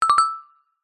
multimedia notification bright metal tuned mallet generic; zapsplat